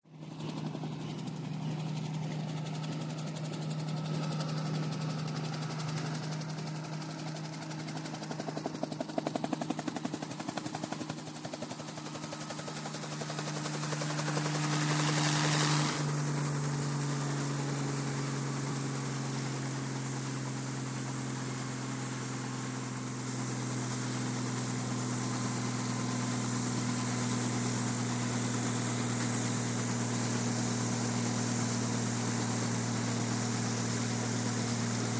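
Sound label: Heli Traffic Idle Liftoff, helicopter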